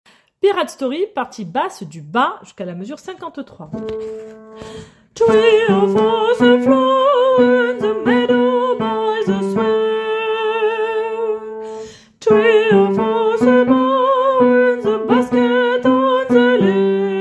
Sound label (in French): basse du bas